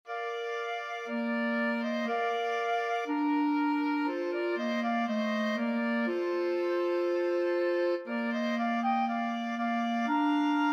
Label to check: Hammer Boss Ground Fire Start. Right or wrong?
Wrong